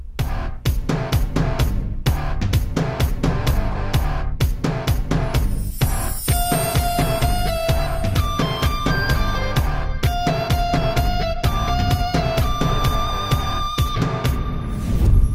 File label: Drum Beats